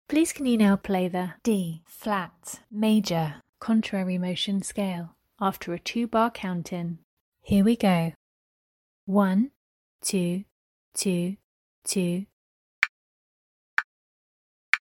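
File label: D flat major contmotionscale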